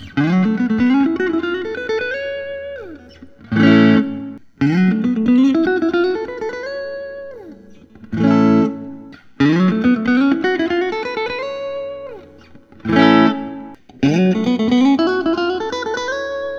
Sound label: stratocaster replica; black